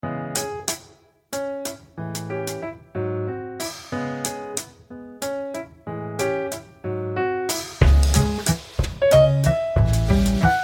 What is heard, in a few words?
jazz waltz trio